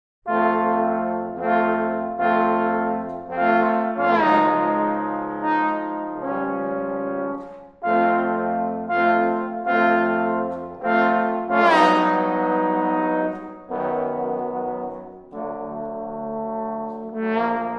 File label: suite for trombone quartet; 2012 senior musical comp; mvt iv